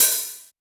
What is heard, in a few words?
small reverb open high hat b key